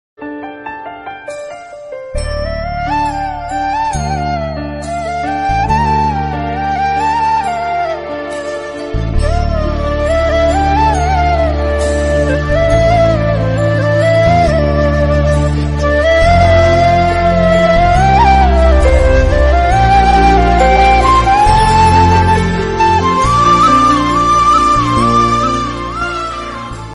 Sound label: mother sentimental love bgm ringtone mothers love bgm; mahesh babu; nani